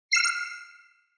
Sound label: Cyber